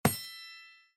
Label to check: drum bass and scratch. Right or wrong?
Wrong